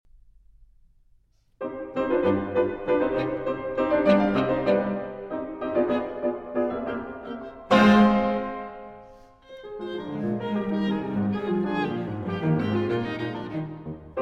Piano Trio in C Minor, Menuetto. Quasi Allegro - Trio; No. 3